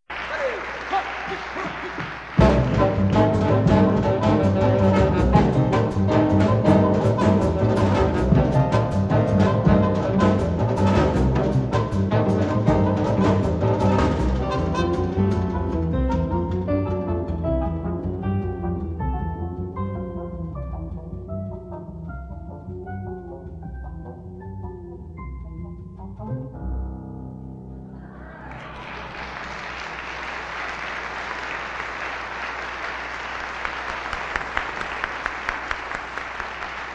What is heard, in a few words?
stomp